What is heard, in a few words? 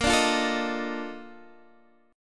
Bb Major 11th Bb down Guitar Half Step Down